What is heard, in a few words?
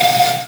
Synths F; vengeance essential club sounds-3